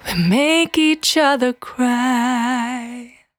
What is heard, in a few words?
Cry 68bpm B, Vocal; Zero-G